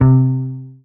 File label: marimba guitar